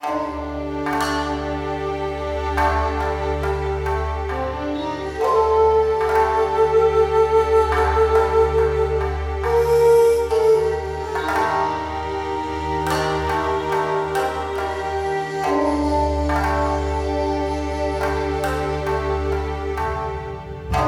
BGM Shop

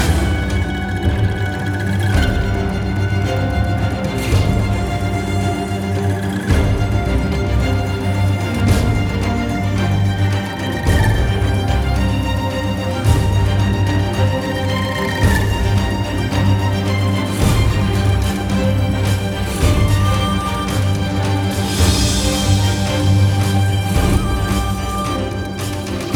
Hillfight

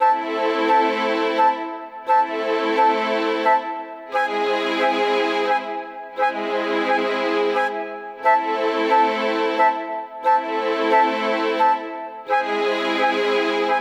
Cannon Pan Out Fail; Go Play Circus Star; Wii

